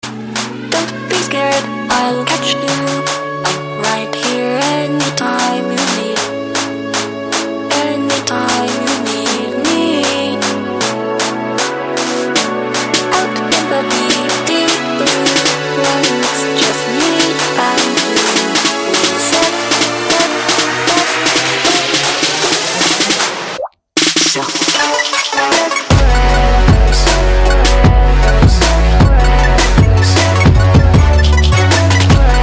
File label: surf